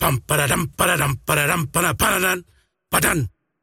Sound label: singing; dota; largo